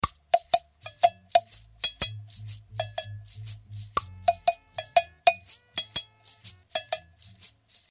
percuss, reggae

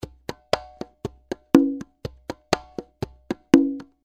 danzon conga

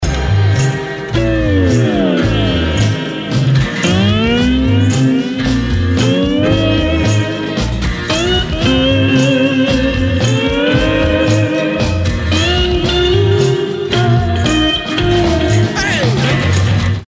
vib pan; effets

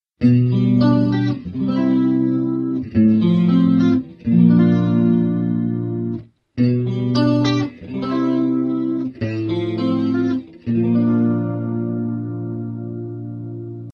chorus, acoustic electric

